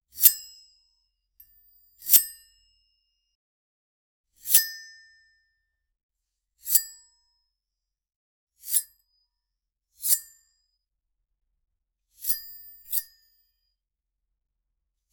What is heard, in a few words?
magic wand casting